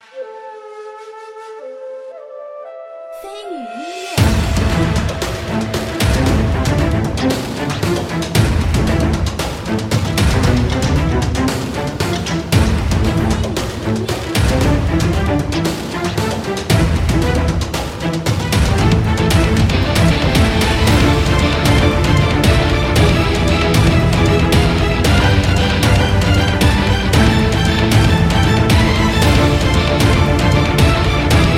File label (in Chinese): 战斗; 我欲封天